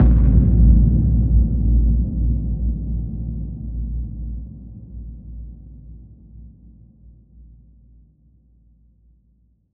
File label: Bass Hit